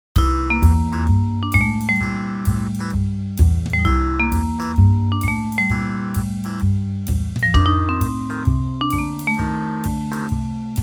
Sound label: MIDI blues; spellman studio